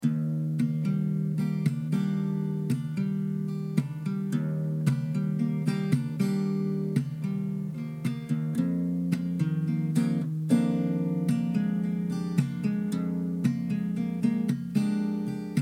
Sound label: D 112 Acc GTR chill groove